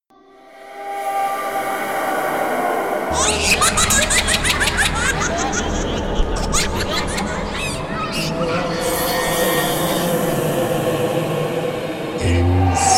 Horror Collection; cinetools

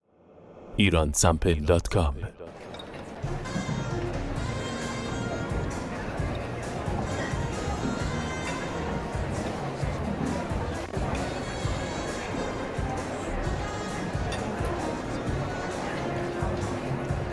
coffee, coffee restaurant; Iransample

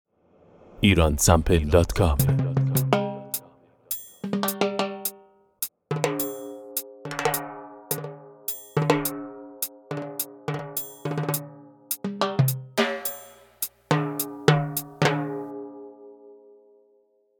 Timbale; timbales

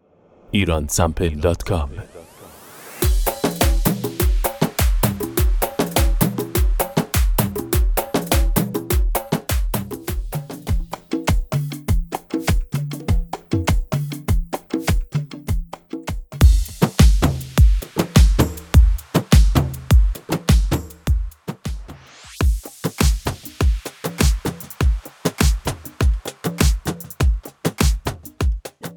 Bandari Bastaki Modern

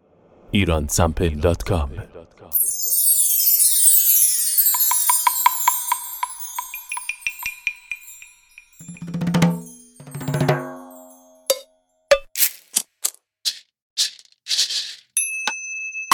perc

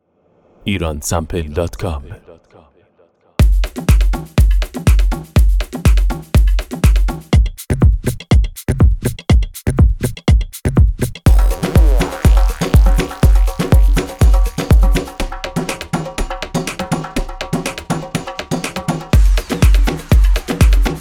Tribal, tribal house loops

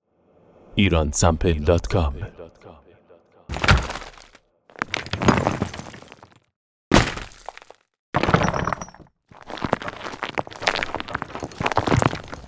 rock sound fx

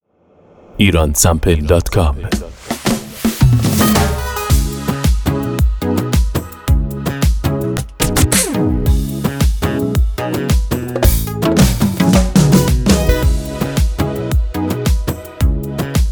fill bandari; bandari fills

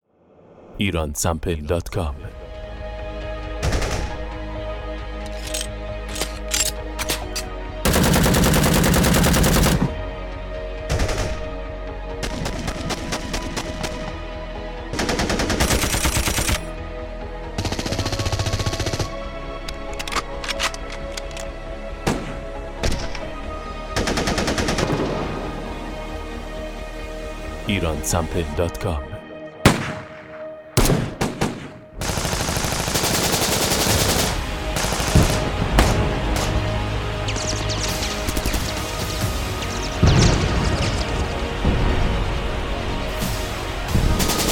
gun; Iransample

